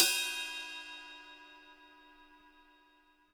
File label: HiHat & Crash, Roland TD-20; HiHats